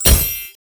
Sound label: freespin update; Amatic; Fruit Box